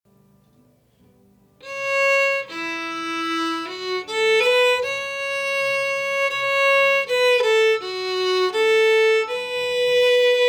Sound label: Margarets Waltz